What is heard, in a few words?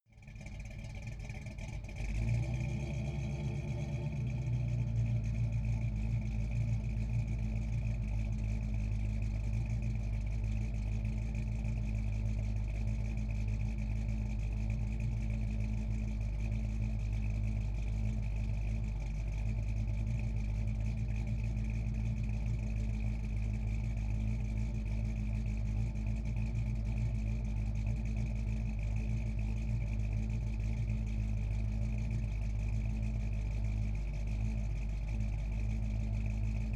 car camaro idle faster exhaust